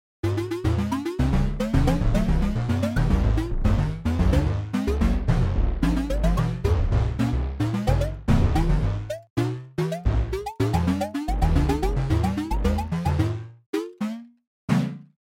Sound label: indian percussion udukai